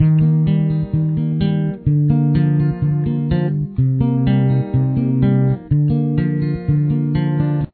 capo 3, guitar

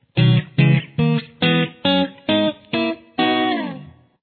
B Major chord progression, guitar

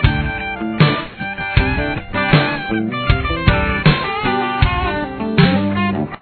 run

